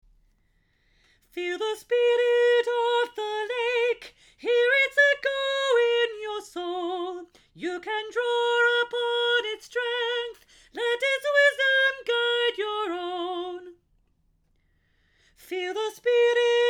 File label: Soprano Round